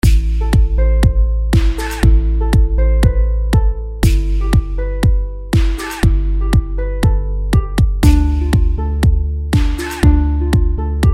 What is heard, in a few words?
trap reggeaton type beat 120 bpm